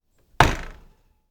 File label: Doors and drawers Office Screen Door; Fischer